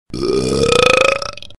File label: Gas